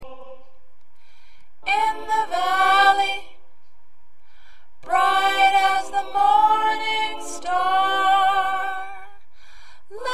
Soprano

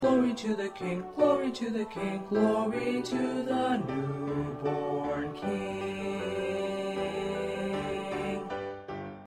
2nd Baritone

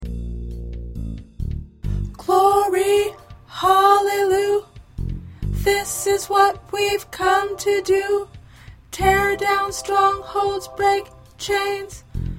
1st Tenor

